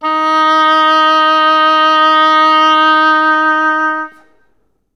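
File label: vibrato D#3; Best Service; Peter Siedlaczek's Advanced Orchestra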